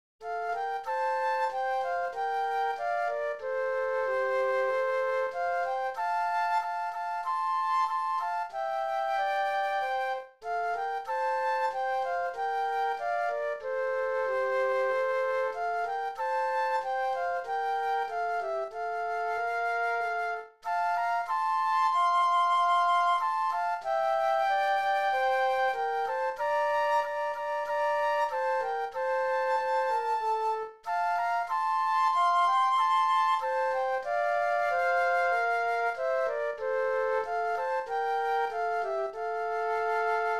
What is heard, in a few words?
Flute Duet